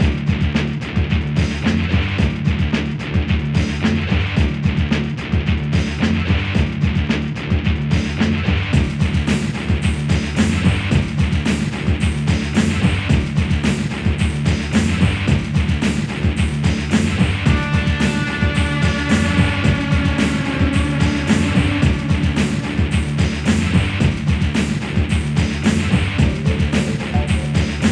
Rock Style

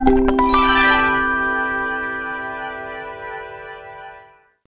Jingle Puzzle Solved; rayman 3 hoodlum havoc gc ps2 windows xbox 2003

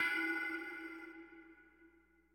Bounce Hit; Boomerang Fu

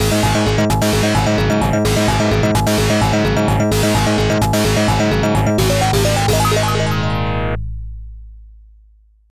Stage Clear; final zone; White Line

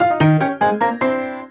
Jingle Cat; english training have fun improving your skills ds gamerip 2006

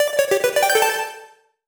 Puzzle Solved Sound; YIIK A Post Modern RPG

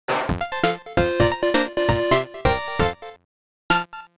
Minigame Win

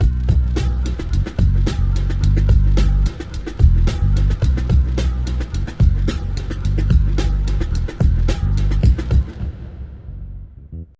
Ditty; NBA Courtside 2002

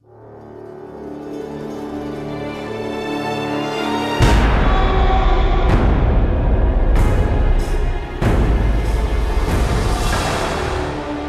Combat - Cinematic

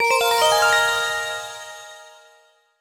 Menu Jingle; Layton's Mystery Journey Katrielle and the Millionaires Conspiracy

